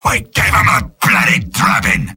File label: sniper cheers; mvm